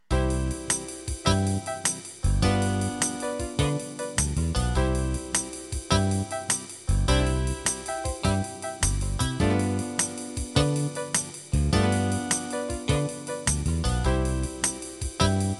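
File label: Rockabilly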